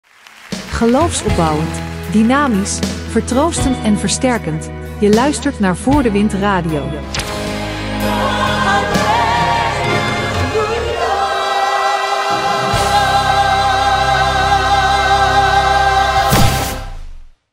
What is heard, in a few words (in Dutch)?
jingle radio; voordewind